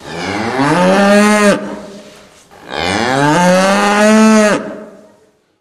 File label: muhhhh